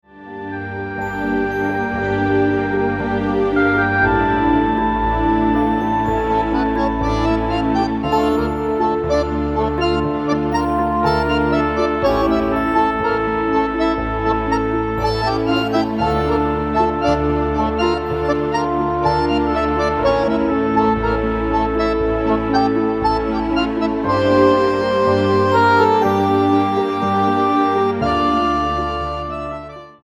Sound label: Tango Mood